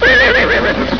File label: neigh